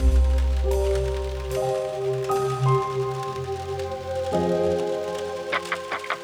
Drizzle, Pads

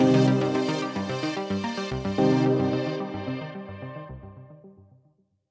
Fadeout, Pads

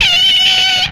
Skid, retro arcade vehicles

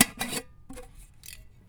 Metal Lid; BB Retail Therapy Sample Pack

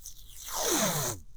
Pull Tape, toolbox rummaging

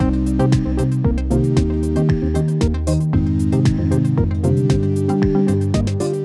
Fun Polyrithms, Loops

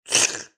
monster; creature SFX

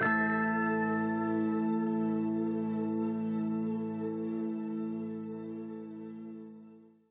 Slightly Metallic, Pads; BB 2HTC Samples Vol 4 Addendum

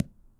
Softcover Tap, books; bb - Books, Paper, Writing

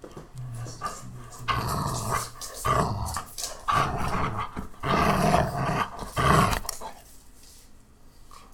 anger dog

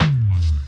Bass Slide; BB 2HTC Samples Vol 4; Weird

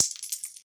glass breaking; breaking falling hit sfx

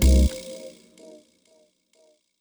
Vibra SLAP, Drums; BB 2HTC Samples Vol 4

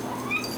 Cat Meows; Micro Pack; Tiny Tito

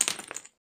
rock falling

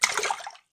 splash; water splash slime sfx

